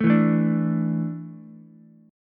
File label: D Suspended 2nd D down Ukulele; strummed